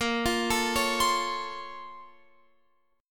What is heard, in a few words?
A sharp Minor Major 9th, down, Guitar, Open F; A#mM9 arpeggiated; JGuitar